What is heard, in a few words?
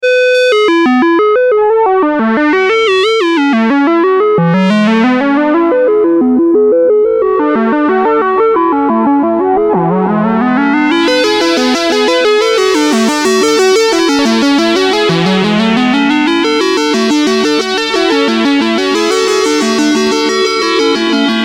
PWM Sequence; tinysizer